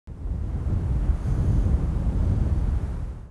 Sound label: Storm